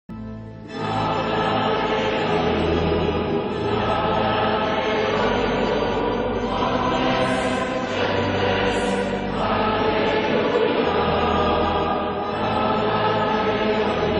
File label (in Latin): LAUDATE